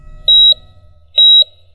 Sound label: Heart Monitor; Mad Money; TIPro